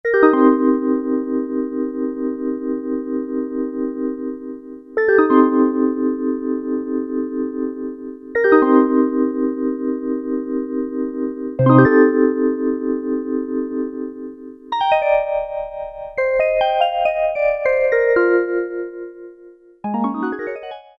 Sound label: Kawai SX-240 VIBES